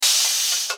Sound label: Air Valve Blast